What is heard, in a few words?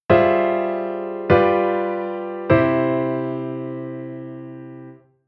Chord IVm on V-V7-I